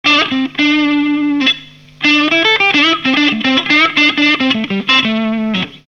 Rat2 Sharp Blues Solo, Guitar Effects Pedals; Pro Co

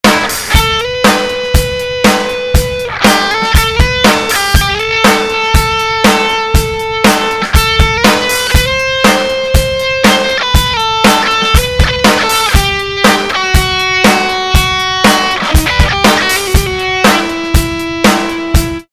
Easy Sustain Solo, Guitar Effects Pedals; Pro Co

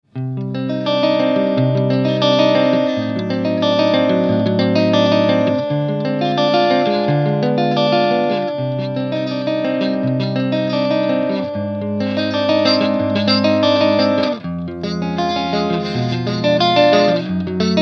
dry, Marshall; Guitar Effects Pedals

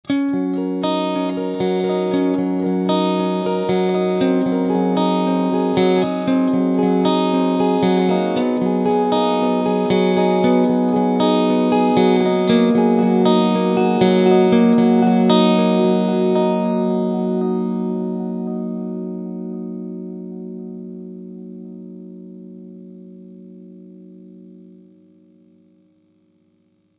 Echo DE7, Ibanez Guitar Effects Pedals

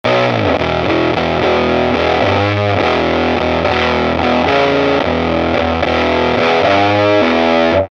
Rock, Guitar Effects Pedals; Pro Co

